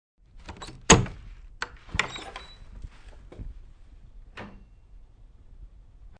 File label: An old screen door is shut and then creaks open slightlys.; Wood Screen Door Closing 1